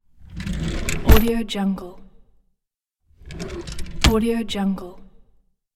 door cabinet; kotanjian

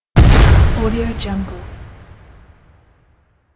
impact

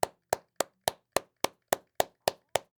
hand claps

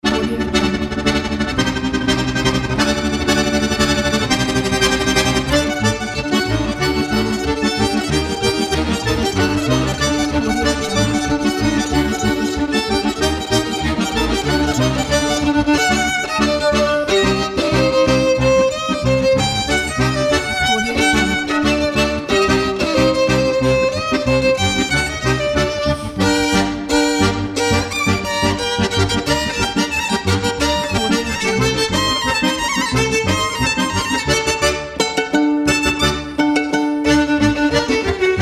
accordion and violin

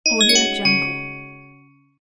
task completed

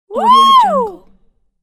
cartoon fascination shout

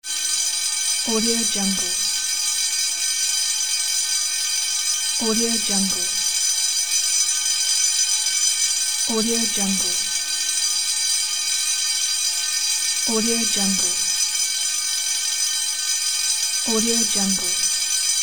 fire alarm bell

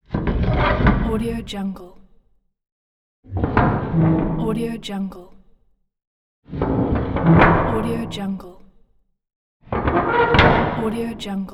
metal door

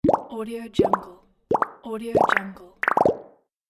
pop sound effects; audiomechanica2

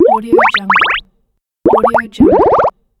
pops

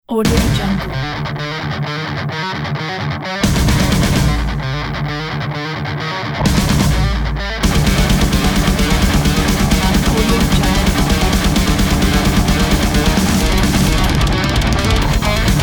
technical metal trailer

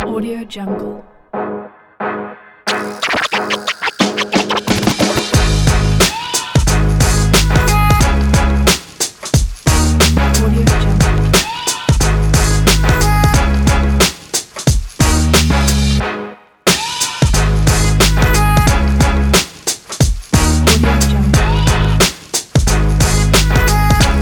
hip hop urban

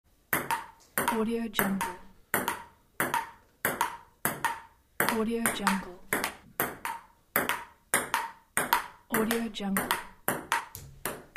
ping pong

